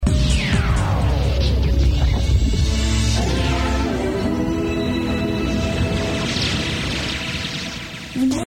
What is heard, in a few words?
jingle